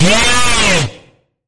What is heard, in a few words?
Sharp Bend, B; Sharp Bend Ahead